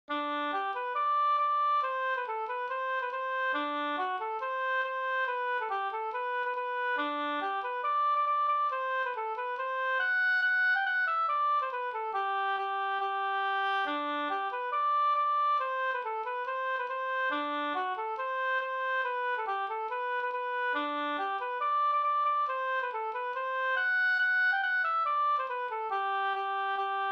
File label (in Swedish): reinlender